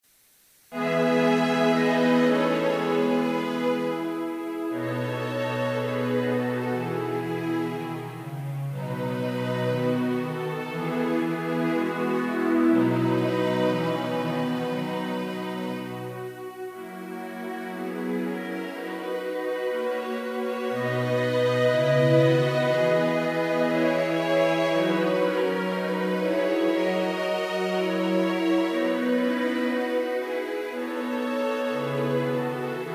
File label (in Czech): Jsem Moravan instrumental